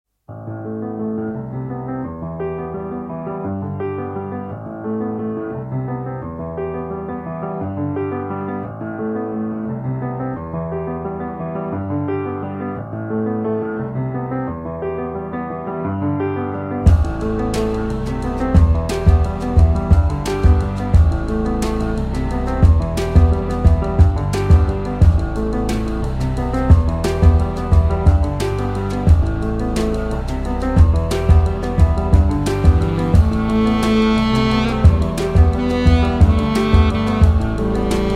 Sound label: Riser